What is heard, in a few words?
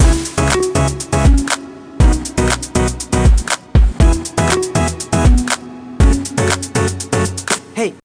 Loops Dance Celebrate; Scratch game maker software